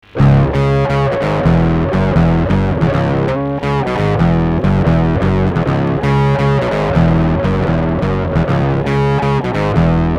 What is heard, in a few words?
gudok; moby dick